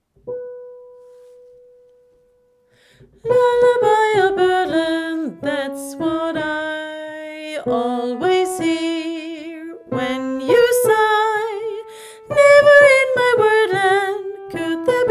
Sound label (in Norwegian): sopran